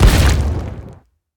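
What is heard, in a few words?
skill slime explosion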